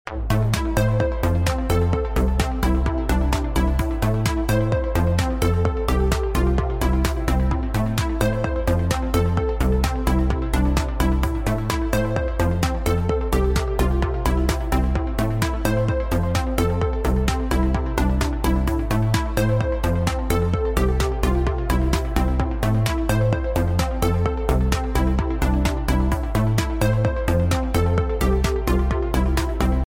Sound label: LUSH101 DISCO